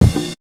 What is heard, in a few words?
Drum, Drum One Shots